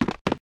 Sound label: Walk Loop